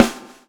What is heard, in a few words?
SWNG